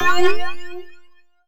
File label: Retro Event UI